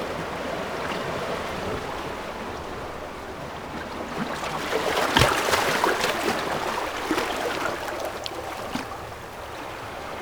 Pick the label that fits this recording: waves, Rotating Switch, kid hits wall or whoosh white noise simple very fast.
waves